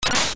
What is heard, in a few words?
sword slash; ff3us